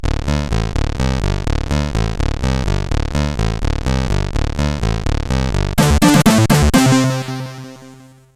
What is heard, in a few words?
Jingle, sid CSG8580R5